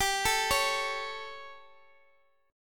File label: G Suspended 2nd Flat 5th G, chord; Gsus2b5; arpeggiated; x,x,x,12,10,9